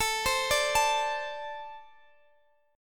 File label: A Minor Double Flat 5th, down, Guitar, Open D